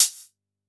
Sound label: Elec Cl Hat, drum